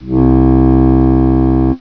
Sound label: overtone